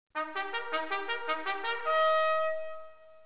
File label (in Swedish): gallopp, signaler; dragoner